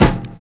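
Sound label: BROKEN SNARE; Univers Sons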